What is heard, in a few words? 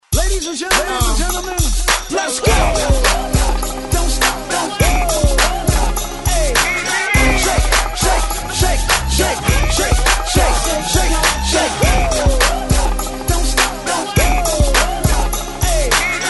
Tamb